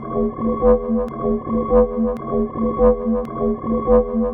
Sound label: Futuristic Machinery, Background Machinery; Julian Ray